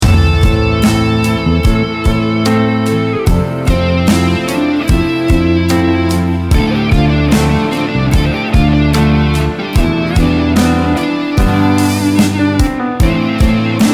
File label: Solo Ballade